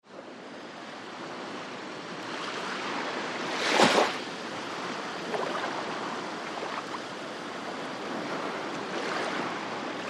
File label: Lht Lapping Waves CU, waves & surf